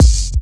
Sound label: Kick